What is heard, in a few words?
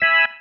Stab One Shots, Organ